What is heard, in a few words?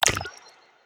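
water magic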